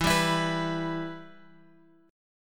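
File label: E-Minor, Em Chord strum; Em Chord {X 7 5 X 5 7} strummed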